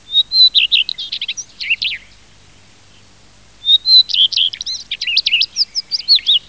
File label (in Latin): Phoenicurus phoenicurus